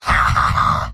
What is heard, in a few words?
m laugh evil; heavy; tf2; garrysmod; mvm